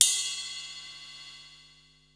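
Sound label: cymbal ride cup; 300 Drum Machines; Yamaha Motif ES-Series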